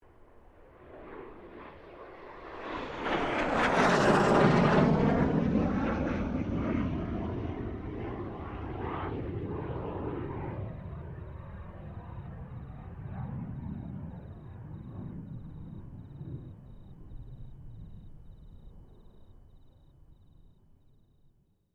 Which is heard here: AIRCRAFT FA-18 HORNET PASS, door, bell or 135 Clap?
AIRCRAFT FA-18 HORNET PASS